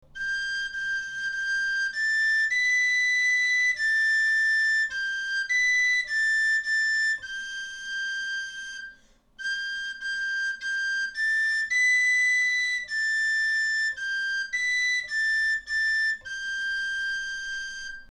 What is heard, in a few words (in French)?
Au Clair de la Lune, galoubet